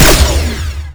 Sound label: gamma shoot; weapons